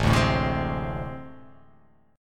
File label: A Minor Major 7th Double Flat 5th, down